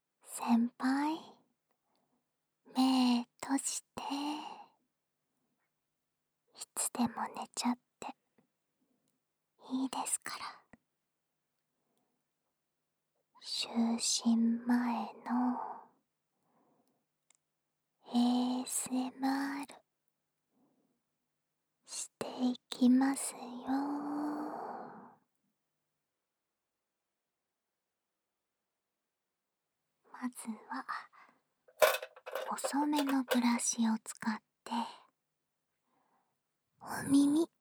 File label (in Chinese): 入睡前的毛刷刷耳ASMR; 樱木学园治愈部～１年C班・五十岚千歌 狂妄冷淡女孩之充满奉献精神的治愈掏耳篇～